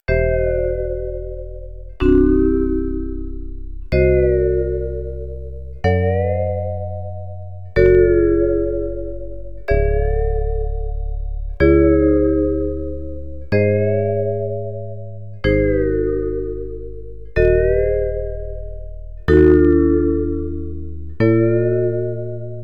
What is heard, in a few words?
drum